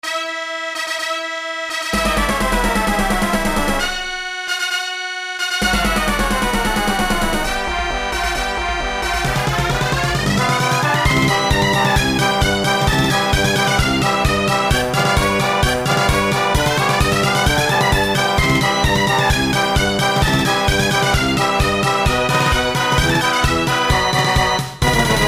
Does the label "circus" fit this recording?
Yes